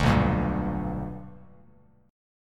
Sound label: B Minor Major 7th Sharp 5th Bass Standard; strummed; JGuitar